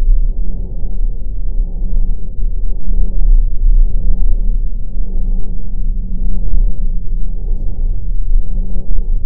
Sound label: core drone